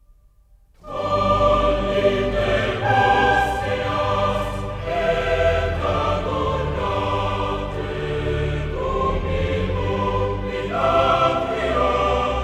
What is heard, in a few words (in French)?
Oratorio de Noël - Choeur; Saint-Saëns; The Mikaeli Chamber Choir; Anders Eby